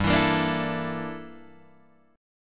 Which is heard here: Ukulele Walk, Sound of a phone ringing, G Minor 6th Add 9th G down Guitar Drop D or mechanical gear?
G Minor 6th Add 9th G down Guitar Drop D